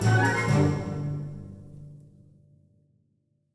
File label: Music Tension Theme Bpm128 End; asterix obelix slap them all 2